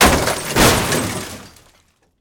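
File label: bulk movement landing; Smite; Cabrakan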